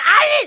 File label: Monk Shout; Playable Tutorial Level; TR2